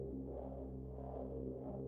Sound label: loop Build; loops; Mindustry